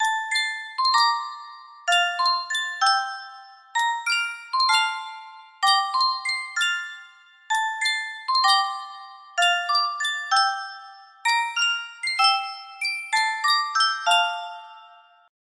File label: superstar music box, waltz